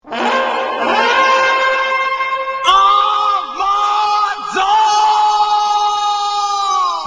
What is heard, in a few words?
RINGTONE